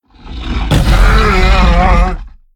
Megatherium sound

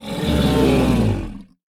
camelsaurus startled

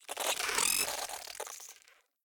Glowbug sound

